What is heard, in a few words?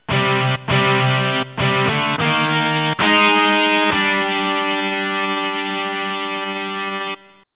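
electric guitar; eguitar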